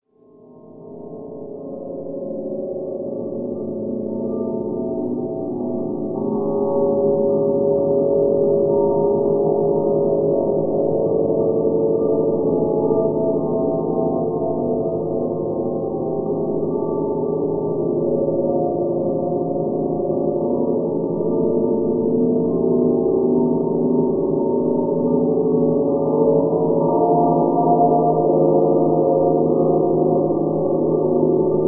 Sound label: dark, bgm